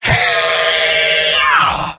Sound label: engineer battle cry; tf2; garrysmod; mvm